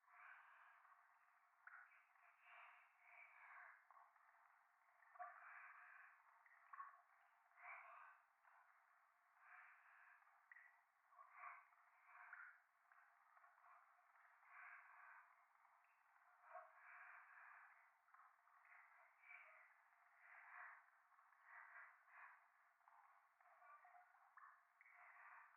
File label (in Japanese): 左耳 密着 処女 喘ぎ 雨上がり; 夢の中